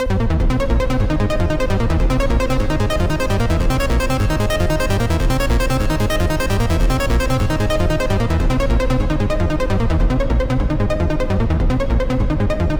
Belgian Bump, acid; 100 acid vol 3

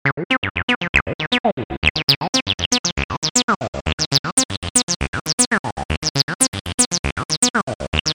Gater New Beat, acid house; Chicago House; acid house vol 1 128 presets for abx3

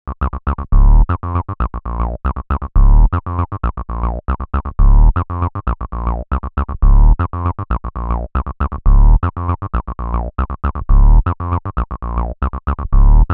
Buffalo Grove, acid house; Chicago House; acid house vol 1 128 presets for abx3

